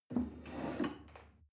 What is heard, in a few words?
chair wood take slide soft